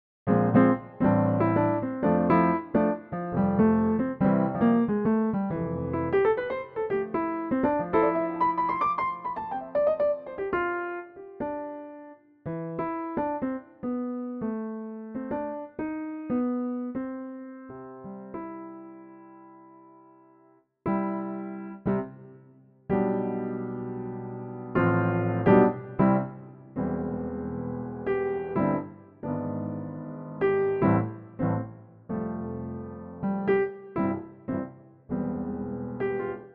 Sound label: Jazzy Chords